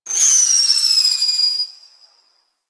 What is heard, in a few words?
Spam Projectile Whizz; Warframe; Teralyst; Ropalolyst; Abilities